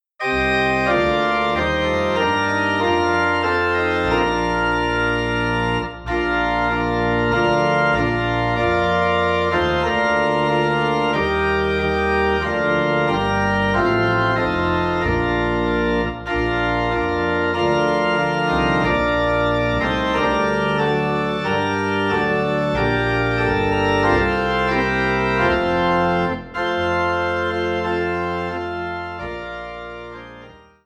Organ in Bb; christmas; Angels From the Realms of Glory

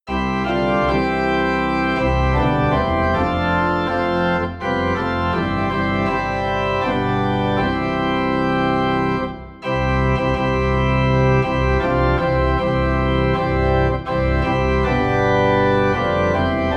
Organ Low in C; All the Way My Savior Lead Me

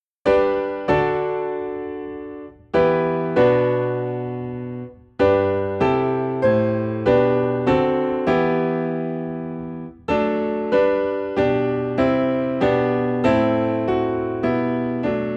Piano in E Minor; christmas; O Come O Come Emmanuel

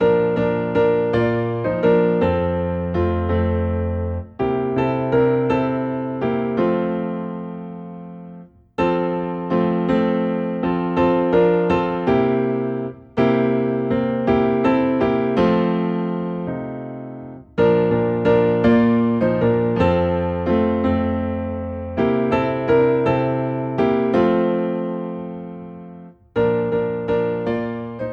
Piano in F

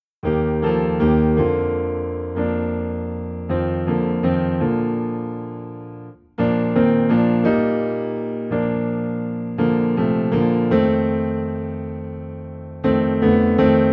Piano, Low in B Flat; Have Thine Own Way Lord

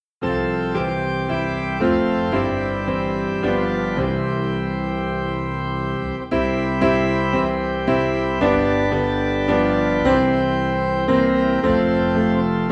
Piano and Organ Low in C; Blest Be the Tie That Binds

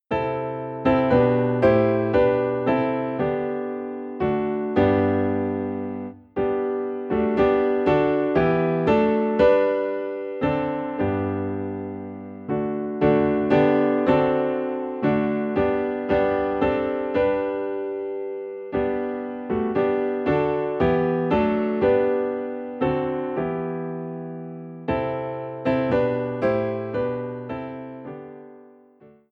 Piano in G

